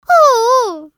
Caramel Miss Sound; Jolly Battle

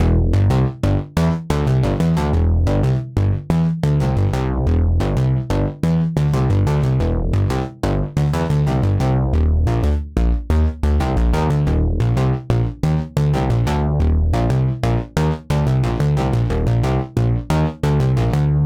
Poly bass

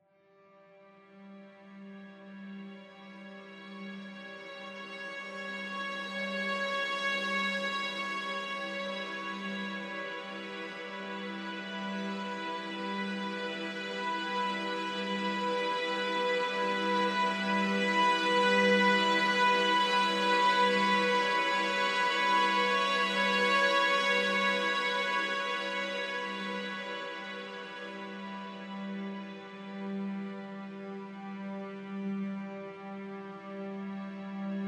Pre-Funeral

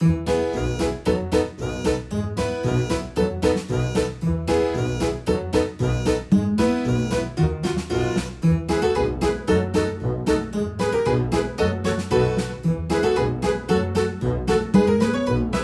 Gameplay; Everybody 1-2-Switch!

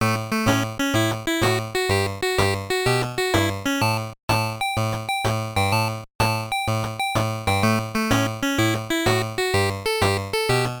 Puzzle BGM; O-chan no Oekaki Logic; WonderSwan

